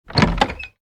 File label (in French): porte